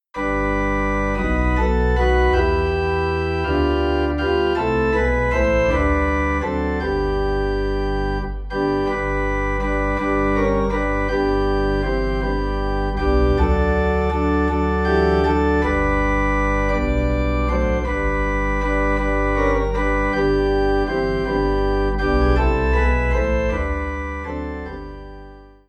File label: Organ in G; Oh How I Love Jesus